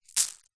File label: small stones handful drop onto others; foley; zapsplat